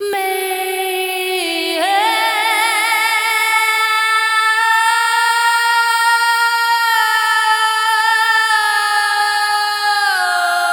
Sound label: Dance Vocals, FEMALE